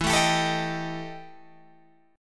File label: E Minor Major 7th Double Flat 5th E Guitar Open G